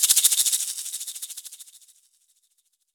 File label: Egg End Off, shakers